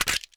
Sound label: mac11 mag out empty, weapons